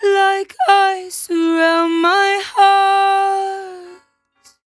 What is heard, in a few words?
57bpm Am, Velvet Vocal; Zero-G